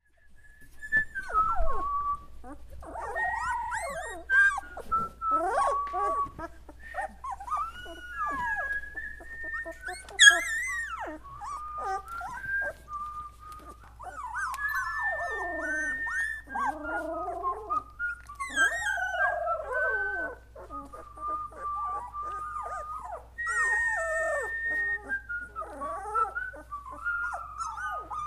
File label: puppies singing